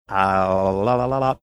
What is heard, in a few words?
some thinking mouth sounds